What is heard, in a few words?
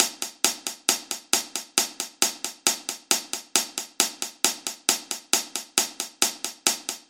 basic hihat